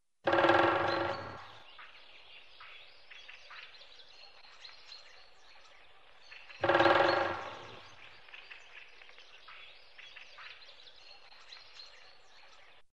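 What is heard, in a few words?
zhelna golos, black woodpecker voice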